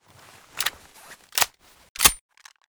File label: fnx45 reload empty